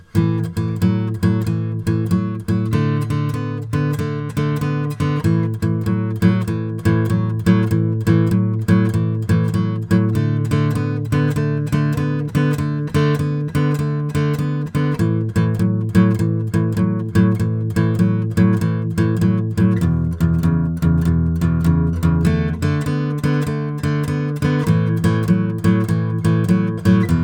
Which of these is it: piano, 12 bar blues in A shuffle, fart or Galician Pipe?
12 bar blues in A shuffle